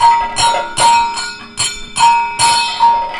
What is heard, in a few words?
KATAK, Indian Traditions